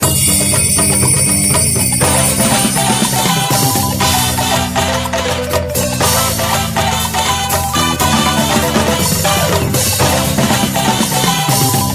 Jingle Result; Taiko no Tatsujin DS Touch de Dokodon

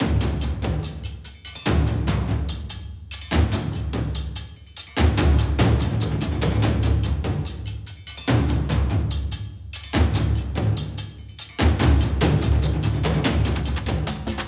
Explore Perc Loop; Skykeepers; PS4, Windows, Xbox One

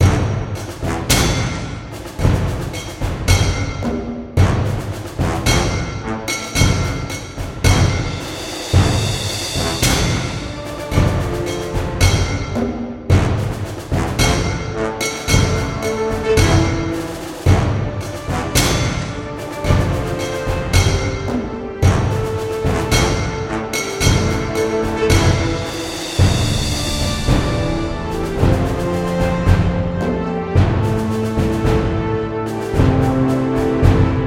Town Music; Hero Siege; Android

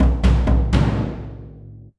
drum; bannerman

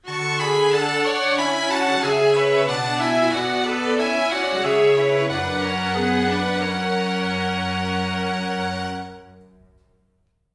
gameover; Occult Reraise

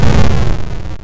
Close; Gravitar

